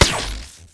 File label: imp bullet energy titan; Titanfall 2